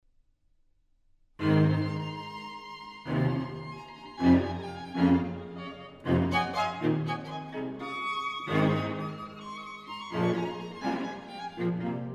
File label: String Quartet; Edward Cowie; 'Birdsong Bagatelles'; Jay